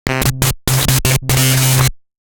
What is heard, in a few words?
Synth - Rectified Electric; SoundMorph; Sinematic; Source Recordings